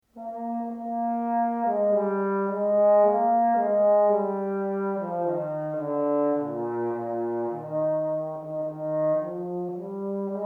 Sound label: baritone